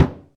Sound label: mid tom drum one shot c sharp key